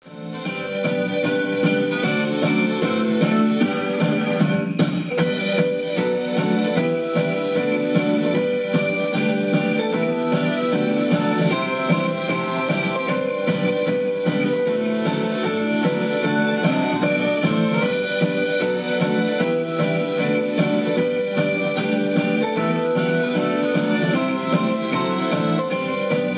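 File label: background music xmas; yakuza ryu ga gotoku kiwami 2 pc gamerip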